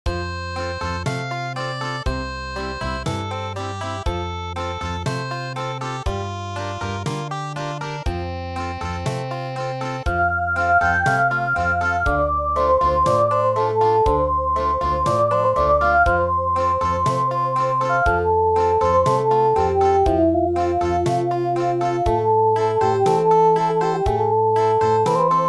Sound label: Country